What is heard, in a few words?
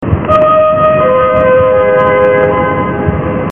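Metro sound of metro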